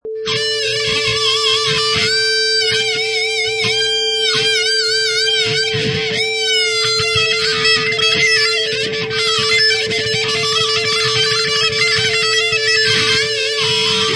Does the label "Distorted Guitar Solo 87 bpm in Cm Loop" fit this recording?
Yes